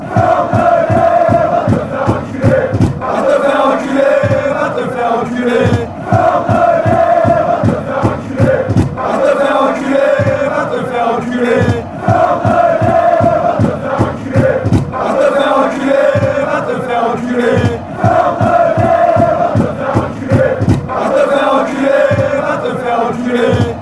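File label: bordellais, football chants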